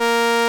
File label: SOLINA, String Machines